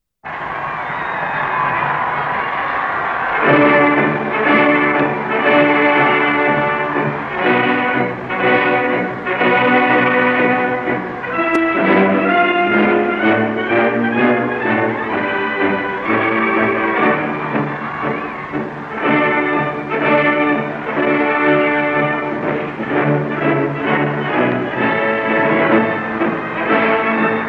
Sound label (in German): Badenweiler Marsch